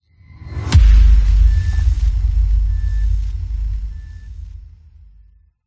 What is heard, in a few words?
Boom Impact Epic Boom Trailer; PMSFX